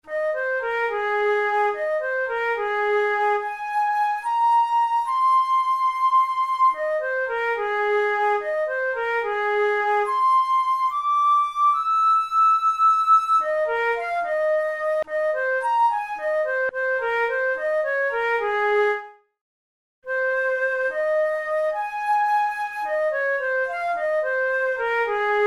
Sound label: barcarolle, flute; burgmuller op100